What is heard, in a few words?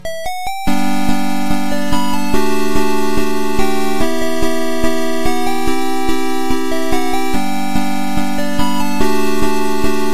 8 Bit; Frozen